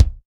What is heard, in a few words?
rich mid range kick single shot b key, kick drum single shot